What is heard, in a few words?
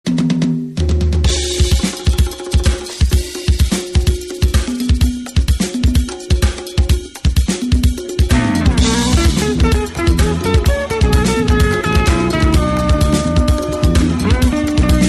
Logo Music